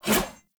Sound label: Sword Whoosh